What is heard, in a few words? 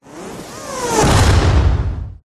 whoosh; Audio Mangler